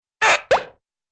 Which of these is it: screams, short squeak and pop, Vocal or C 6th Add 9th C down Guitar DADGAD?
short squeak and pop